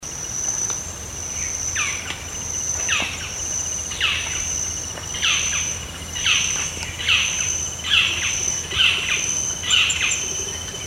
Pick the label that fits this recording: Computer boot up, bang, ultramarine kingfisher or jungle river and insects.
ultramarine kingfisher